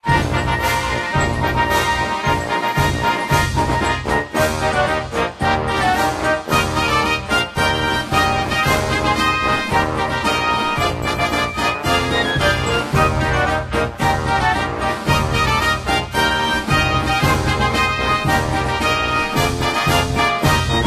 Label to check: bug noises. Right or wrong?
Wrong